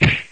Blow

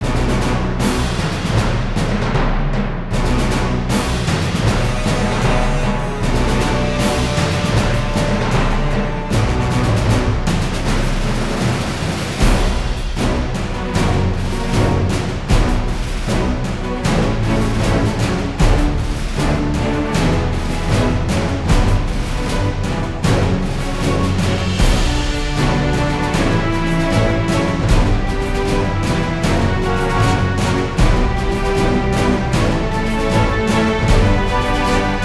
Battle

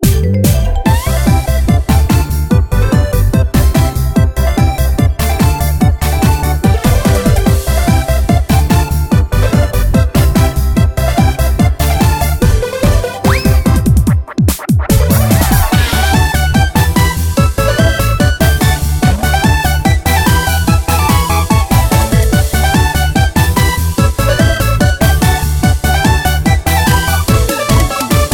bgm

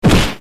hand

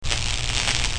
electronc

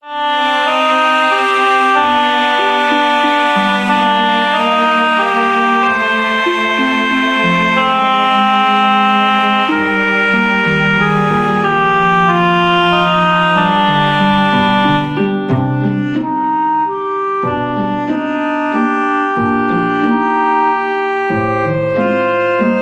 Bgm Fantasy

